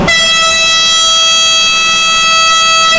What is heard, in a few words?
klaxon, Train; Expreso AZAFT Tren Azul